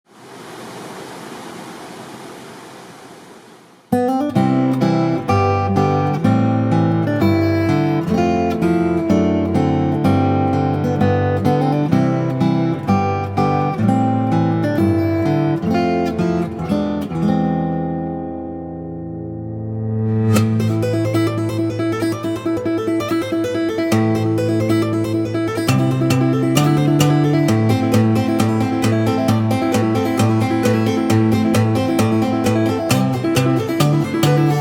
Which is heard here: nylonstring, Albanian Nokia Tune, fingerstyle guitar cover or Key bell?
fingerstyle guitar cover